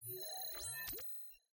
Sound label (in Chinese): 季后赛 地图动画; nba